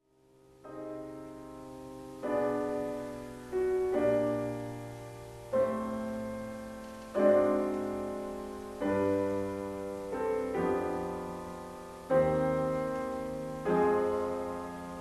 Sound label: Prelude in C Minor Largo